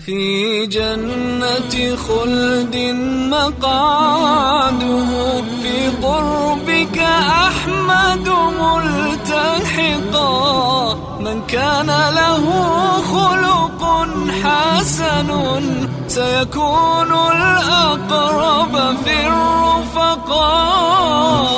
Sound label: new islamic tone ringtone